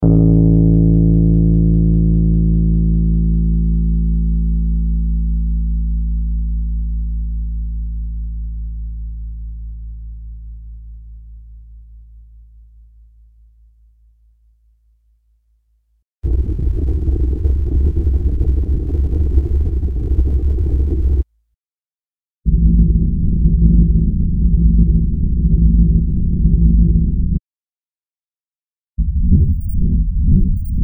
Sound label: Bass